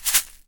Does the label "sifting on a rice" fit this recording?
Yes